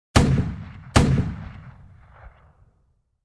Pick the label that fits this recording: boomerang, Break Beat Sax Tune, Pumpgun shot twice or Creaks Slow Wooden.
Pumpgun shot twice